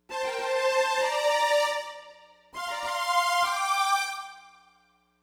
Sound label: Smooth Groove Strings, 70s Analog Funk; Sonic Foundry (Sony Creative Software); What it Is!